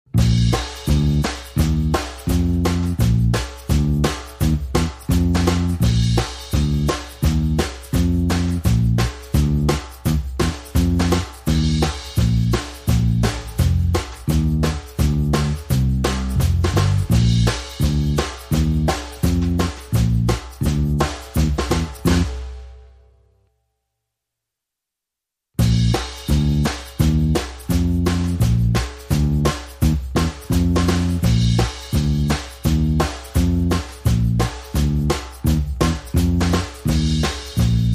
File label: Drums Percussion and Bass Only